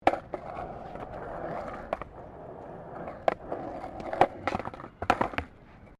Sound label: SKATEBOARD ON THE BOARD FLAT; Skateboard sounds; Skateboarding Sound Effects; Skateboarding (Sound Effects:Sports)